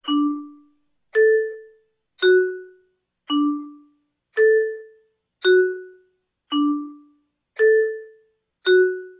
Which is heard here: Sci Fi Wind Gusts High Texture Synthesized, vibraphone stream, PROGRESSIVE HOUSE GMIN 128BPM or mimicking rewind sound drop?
vibraphone stream